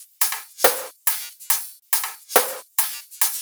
drum Loop 140BPM no Kick no clap; Vengeance - Essential Clubsounds Vol.5; VEC5 Drumloops; VEC5 Multi Loops 140BPM

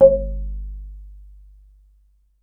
BASS MARI, Pitched Percussn, Bass Bamboo Mrmb; E-MU Producer Series Vol. 7 – Old World Instruments

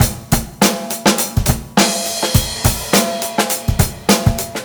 BEAT, Roots Funk; USB Soundscan vol.41 - Roots Funk; AKAI

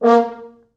4 FrHorns; A#2; East West; Quantum Leap Horns Sax; 4 FrHorns MOD

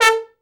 TROMB; Best Service Gigapack 1; BR TROM

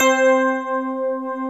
C4, PIA DX; Best Service Gigapack 1

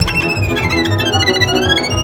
VIOLIN LINES; Best Service; World Colours

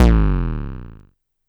One Big Boom

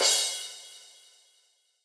Crash Cymbal; Yamaha DJX-2B

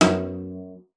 CELLO FINGER F#2; Xsample VOL-5

